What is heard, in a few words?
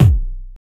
BD, Grunge Hip Hop; USB Soundscan vol.34; AKAI